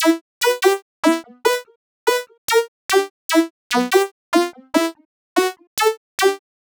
Hi-Pass Filter Synth; Sonic Foundry (Sony Creative Software); Club Joints and Street Anthems

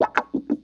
96 Wha 3; Mo' Phatt; Digital Sound Factory Mo' Phatt

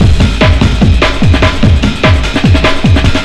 SPEED, Big Beat Drumloops

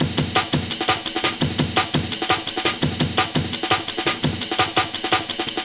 Kickin Break, Drum & Bass, Beats & Breaks

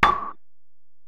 EPerc, Alesis DM-PRO

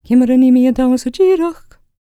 GAELIC, World Voices, GAELIC FEM

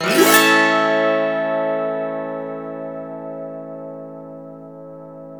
AUTO HARP

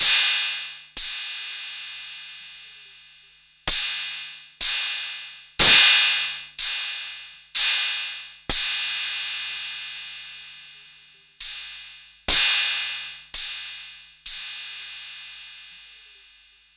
808 tape crash

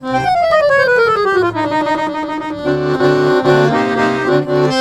Balkanish Traditions; USB Soundscan vol.63; AKAI

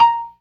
PERC, ORGAN; Keith Emerson Signature Series

